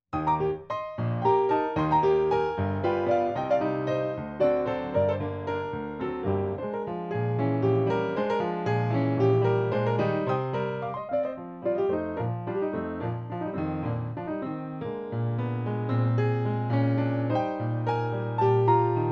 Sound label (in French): Valse; Mel Bonis